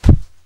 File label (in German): SCHNEE